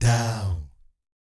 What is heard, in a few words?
DOW A#2, Vocal Jazz Fx; Spectrasonics; Vocal Planet Vol.3 Jazz Fx